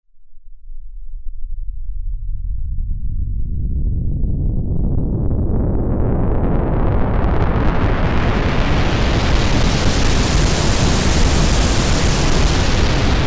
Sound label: Space Shuttle